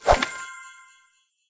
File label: Spell Selection Screen In; Angry Birds